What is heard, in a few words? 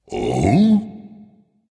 seriously dragon; fafnir